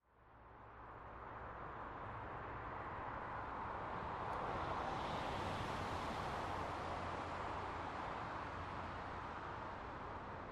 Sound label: Dist Highway Traffic